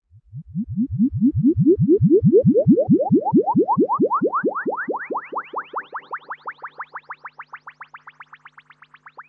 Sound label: cheesy synth wobble up